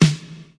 puffy snare drum sample E key, steel snare drum tuned to the E note